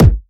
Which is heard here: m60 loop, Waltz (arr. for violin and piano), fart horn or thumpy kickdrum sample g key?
thumpy kickdrum sample g key